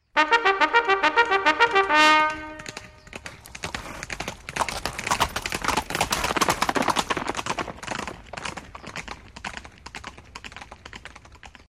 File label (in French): Sonnerie Au Galop